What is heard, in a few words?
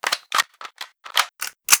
Reload; Angry Goy II; SoundEffects